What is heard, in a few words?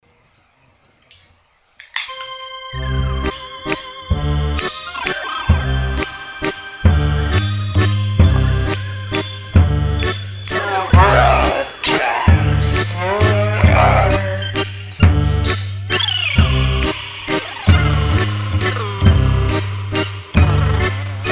Russian Waltz; ransombambi